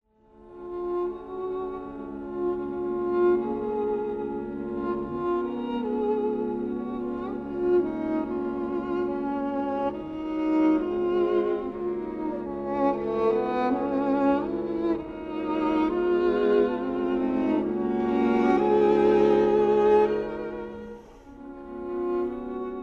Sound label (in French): Andantino doucement expressif, String Quartet